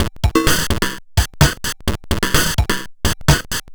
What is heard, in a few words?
Bent TR505 (128BPM), Circuit Bent